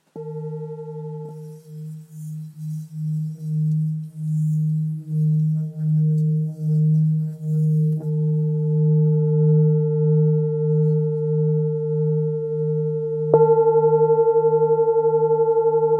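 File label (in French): Full Moon re diese, bols chantants tibétains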